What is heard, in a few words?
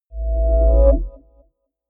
chord swell short